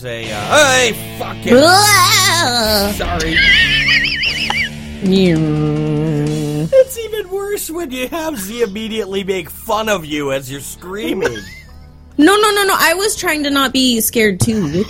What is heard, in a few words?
scared